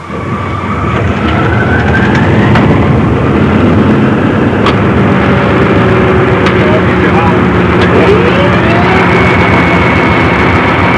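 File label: turbulence; Transportation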